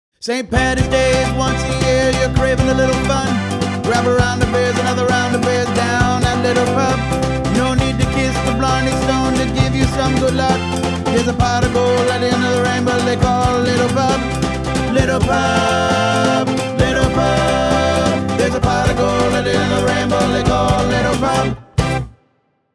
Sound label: jingle; Little Pub